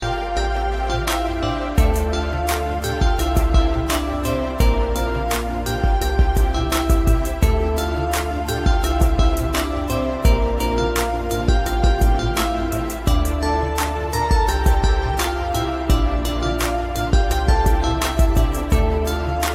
valentine tone ringtone

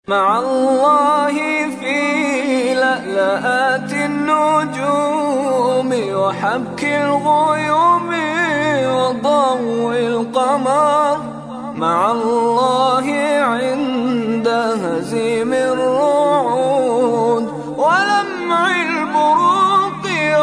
islamic ringtone

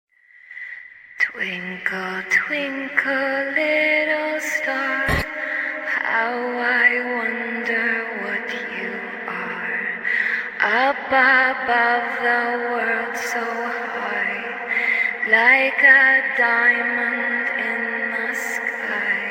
creepy twinkle ringtone

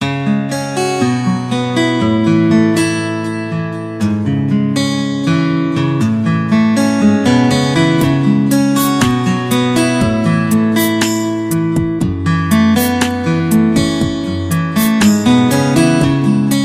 acoustic guitar ringtone; xiaomi

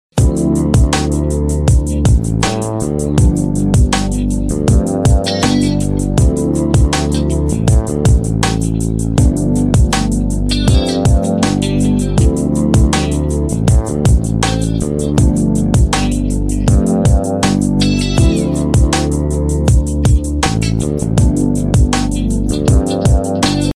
simple tone ringtone

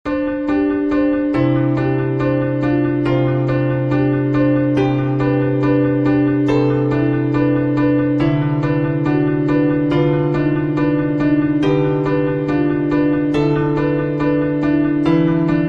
piano theme ringtone

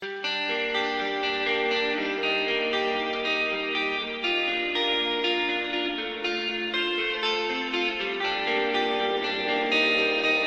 iphone latest instrumental ringtone

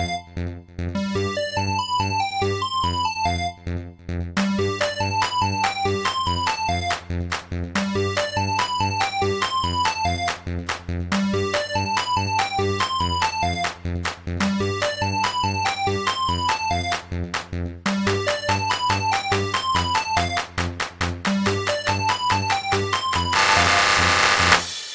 samsung remix ringtone

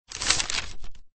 sms ringtone

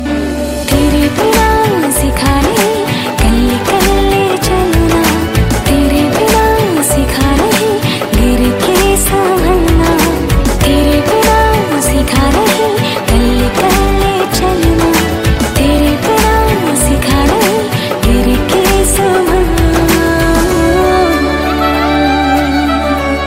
hindi song ringtone, bollywood

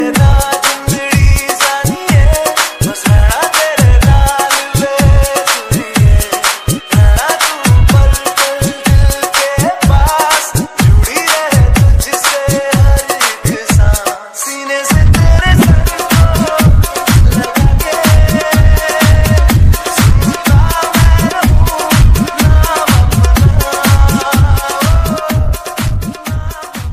dj remix punjabi ringtone

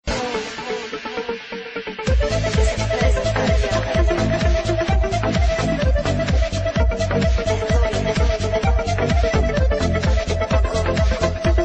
pop ringtone

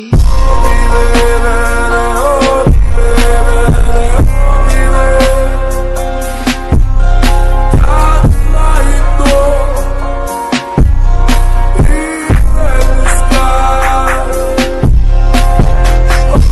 english ringtone; english ringtones

